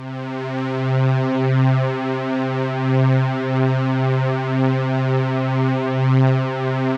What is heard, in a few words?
STRING, SYNTH; Trance; AMG - Global Trance Mission VOL-2